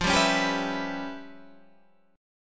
Db 7th Sharp 9th Flat 5th F, chord shape

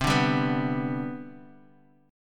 C Major 7th Suspended 2nd C down, chord

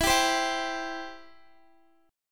E Minor Sharp 5th, Guitar, 4F,4A,5C,5G,5C,6E; Em#5; strummed

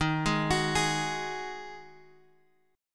D sharp Minor Double Flat 5th; D#mbb5 Chord {X 6 6 X 7 4} arpeggiated

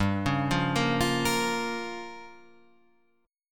G Minor 7th Flat 5th

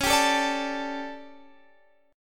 D Major 7th Suspended 4th, chord

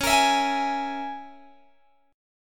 C sharp Minor 6th C sharp, Guitar Open G

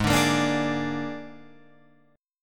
Ab Suspended 2nd Flat 5th down Guitar Open F; strummed; JGuitar

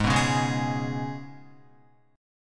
G sharp Minor Double Flat 5th G sharp, Guitar; 3,2,1,1,1,4; G#mbb5 strummed

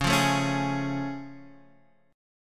A Augmented Major 7th Db

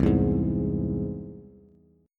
C Augmented, Bass, chord; strummed; JGuitar

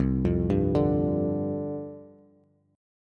C Suspended 2nd Suspended 4th C Bass; arpeggiated; 8,8,5,7; JGuitar

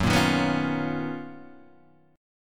B Major Sharp 11th F sharp

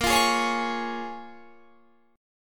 Bb Minor 7th, Guitar, Standard, chord; Bbm7 strummed

